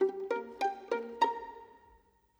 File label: Violin Pizz, Orchestral; Sonic Foundry (Sony Creative Software); Orchestral VOL-2; Modern 32